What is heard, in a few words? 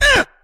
uh